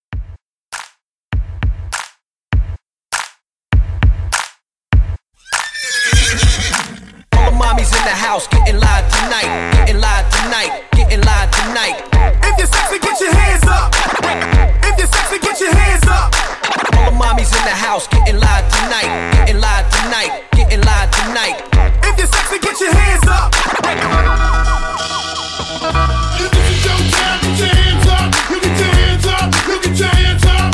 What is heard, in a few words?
Partybreak Re Drum 100